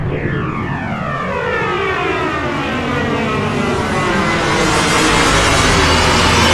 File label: slowmo; lastshot